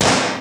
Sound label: snare drum